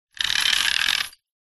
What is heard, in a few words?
industrial chain metal medium pull over metal